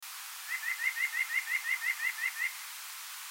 White breasted Nuthatch Sitta carolinensis carolinensis